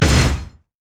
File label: steam golem basic attack; LoL; blitzcrank